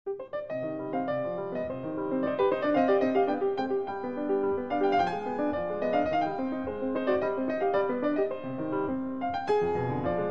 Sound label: Piano Sonata No. 8 in C Minor, Op. 13 Pathétique III. Rondo - Allegro